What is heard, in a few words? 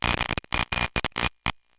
glitched tones AM Radio Interference